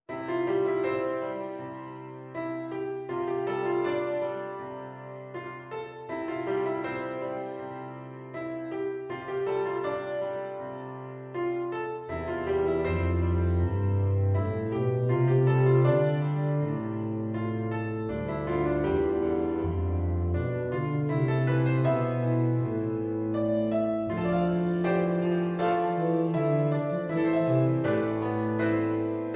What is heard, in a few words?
TUBA; eb bass or bb bass